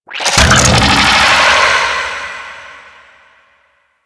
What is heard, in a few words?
Infested Shield Cast; Warframe; Infested Alad V